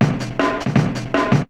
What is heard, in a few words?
DRUMLOOP D+B 160, Drum+Bass Loop; eJay